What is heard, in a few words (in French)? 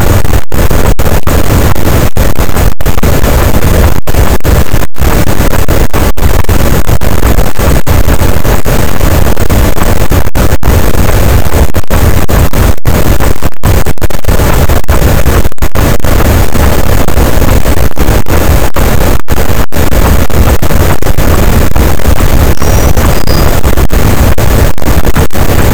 cachalot, boat; Physeter Macrocephalus